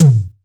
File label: Toms Electro